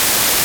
White Noise up; Digital Sound Factory

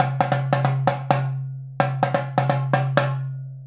Indian Drum; Sonic Foundry (Sony Creative Software); Essential Percussion; Drums

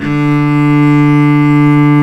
CELLO D3; AKAI S-Series CD-ROM Sound Library VOL-2

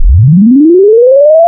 Partials Sine Fixed, wavetables; Architecture 32

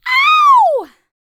Vocal, R&B & Dance, FEM. HUMAN

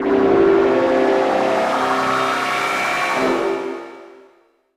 SFX -R, Drum & Bass; Ueberschall; Drum & Bass Resonance

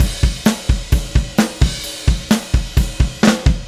Drums Fill, Indie Rock; Sonic Foundry (Sony Creative Software); Indie Rock Anthems; Session 10 - Roswell

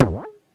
Elastic Band, Foley; musicradar; cartoon caper

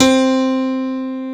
PIANO C4; Big Fish Audio; Synth City

